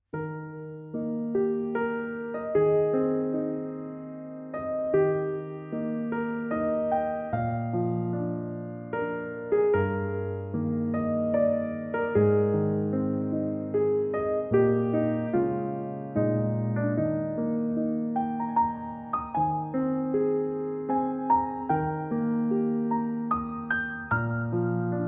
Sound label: piano solo